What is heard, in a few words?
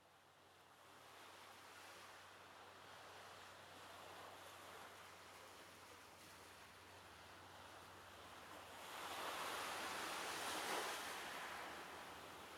Quiet